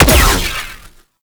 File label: Shocker Fire; Warframe; Corpus; Corpus Railjack; Spec Ops Shocker